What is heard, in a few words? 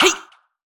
Baton Up; HeavenStudio; glee Club